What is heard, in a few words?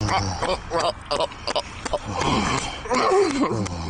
argh argh